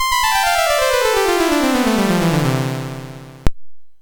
Glissando on a Piano, MOS6581R3

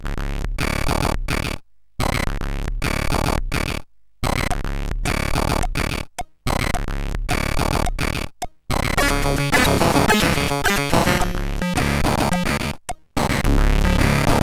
sid CSG8580R5; Geister

